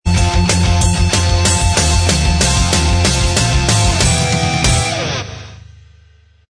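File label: theme Instrumental Track; Power rangers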